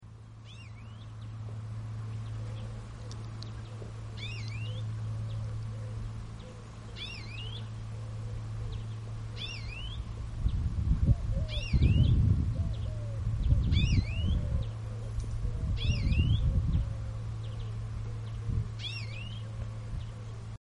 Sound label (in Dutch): Groenling Chloris chloris Geluid; Annelies van Klinken